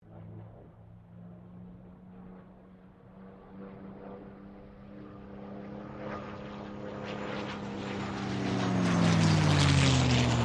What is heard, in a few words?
AIRCRAFT CA-18 MUSTANG PASS; P51 /CAC-18 Mustang; Airplanes; Sound Effects:Vehicles